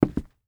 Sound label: Footsteps Wood; HauntedBloodlines; SFX Producer